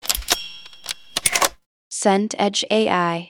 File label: keys lock turn deadbolt